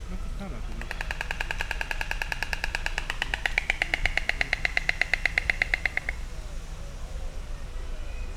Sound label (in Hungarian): kelepel a gólya; szegedi zoo 2011